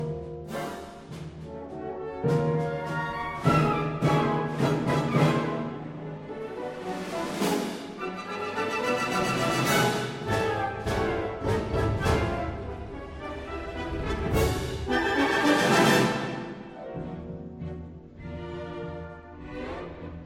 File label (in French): danse grotesque; ravel daphnis et chloe; iii. danse grotesque de dorcon